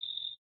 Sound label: night insects; factorio